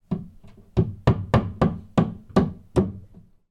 industrial hammer nail into wall next door

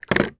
cosmetics lotion bottle down on surface; zapsplat

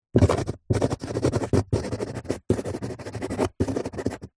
office pencil write on empty cardboard box

